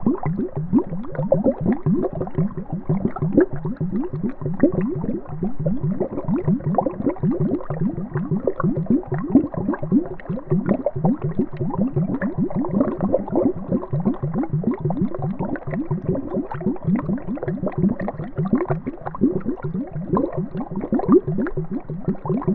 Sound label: gurgling